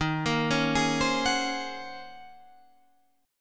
Eb Minor 13th Eb down Guitar Standard; Ebm13 arpeggiated; JGuitar

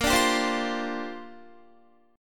Bb 6th Add 9th Bb Guitar; Bb6add9 strummed

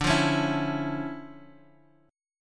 D Minor Major 9th D, guitar; 10,8,11,9,x,x; DmM9 strummed

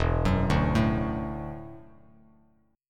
E 9th Suspended 4th E down Bass Standard; E9sus4 arpeggiated; JGuitar

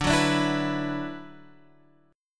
D 6th Add 9th D, Guitar, Half Step Down

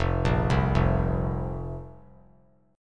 F Suspended 4th F; Fsus4 arpeggiated; JGuitar

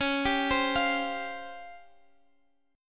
C sharp Major 7th Flat 5th C sharp, Guitar Open F; arpeggiated; x,x,13,14,15,12

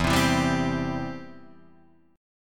B sharp Major E

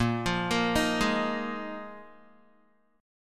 Bb 7th Suspended 4th Bb Guitar 4E,4A,5D,5G,5C,6F

